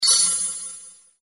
key pickup; Danger Mouse - Ultimate

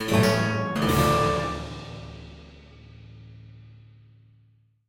win; Bunnicula - Rescuing Harold

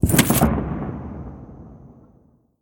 fist start; Nickelodeon - Destruction Truck Derby

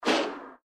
fire fireball; Adventure Time - Elemental; ThrillGames

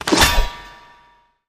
armour pickup; Gumball - Go Long!

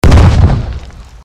explosion; Keep Out! A Dungeon Adventure for the Brave

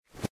enemies get up; Adventure Time - Break The Worm; ThrillGames

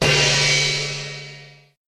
hit gong; Gumball - Go Long!

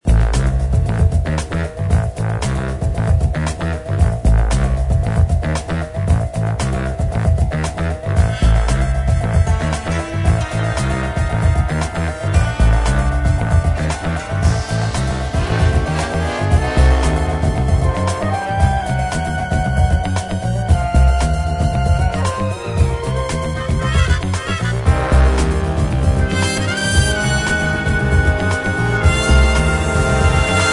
loop; Danger Mouse - Ultimate

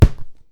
ball kick shoot; Cartoon Network - Toon Cup 2020

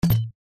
button deselect; Cartoon Network - Toon Cup 2020

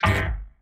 bump into block; Ben 10 - Omnicode

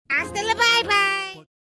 End Screen; Bunnicula - Ghost Guard

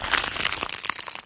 freeze; Gotham City Speed

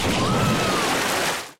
water win; Ben 10 - Heatblast Fight

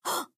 surprise; Ben 10 - Omnicode

